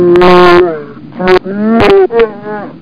CHEW

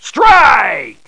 wrong; Music Game (1994)(Microforum)

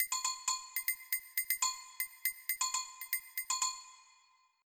agogos; TWIDDLY

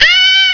Fight - Ahhh; Bangin' Thing 1.0

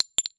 pistol shell med, weapons foley; Spearhead Demo; MacFormat 2003 June

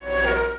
creature restore down

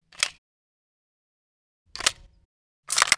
mp40 reload, weapons foley; Spearhead Demo

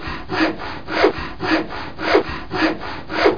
SAW WOOD; NOAH

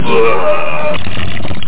wurg; GasWasserTest

